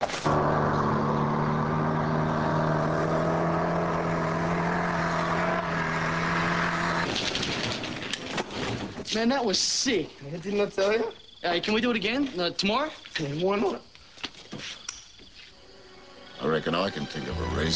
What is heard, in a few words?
motorcycle